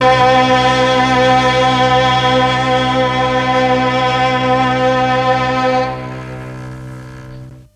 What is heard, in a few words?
MEL STRING; ILIO; Propeller Island Legendary M400